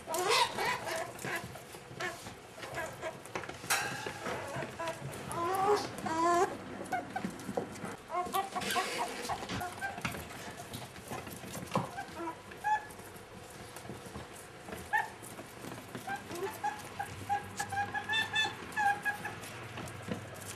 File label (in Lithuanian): kalba seskiukai verkslena